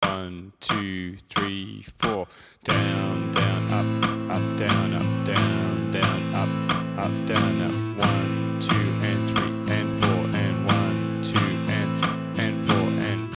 white witch lady am, guitar

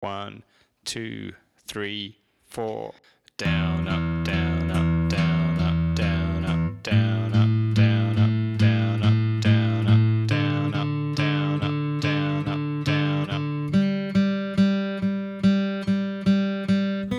picking alternate, guitar

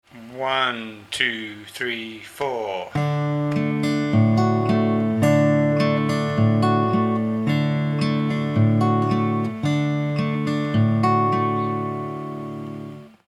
travis D, guitar

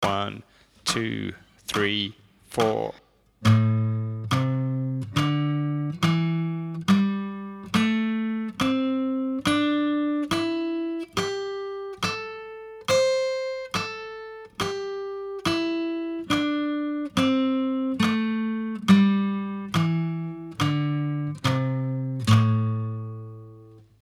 scale a pent, guitar

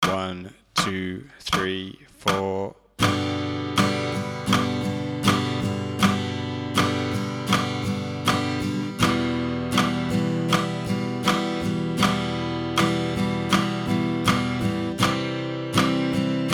chords, guitar

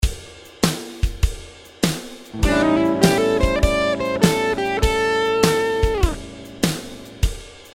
blues note passing, guitar; 3 essential notes; better blues solos

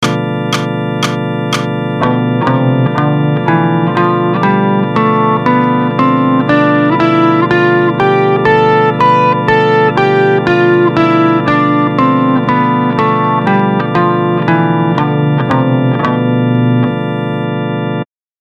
b locrian, guitar; modes

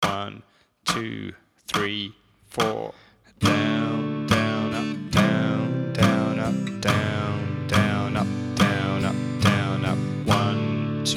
chords, guitar

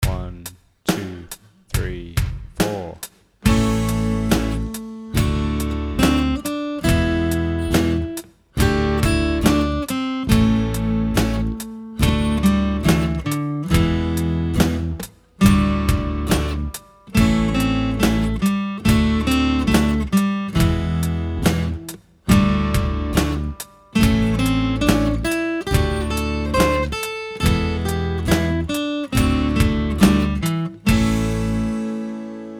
wild tune solo, guitar

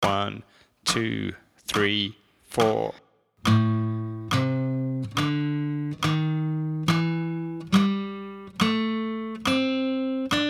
scale a blues, guitar

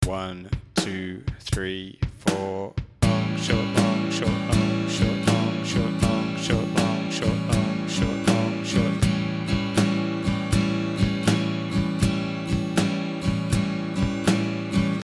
blues a swing, guitar

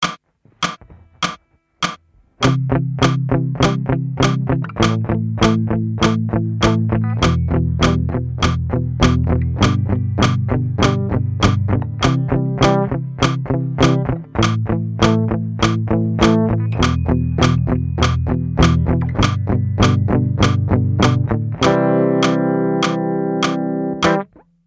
palm muting

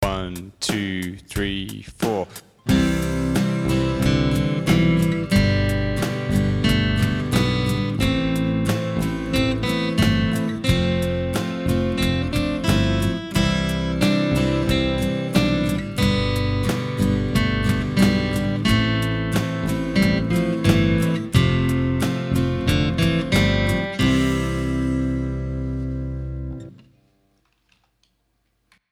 4 chord song solo, guitar

